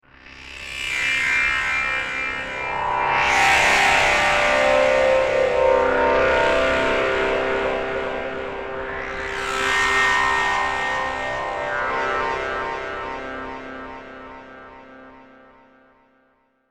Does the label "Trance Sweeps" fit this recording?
Yes